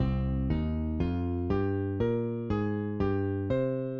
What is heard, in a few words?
Jazz Piano Scale